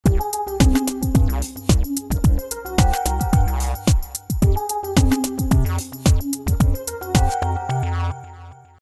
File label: Electro Boogie, ringtone; Sony Ericsson; K310i